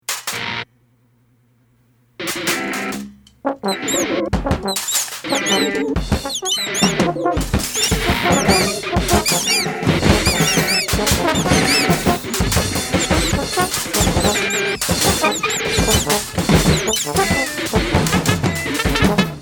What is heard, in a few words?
8th note med loud quiet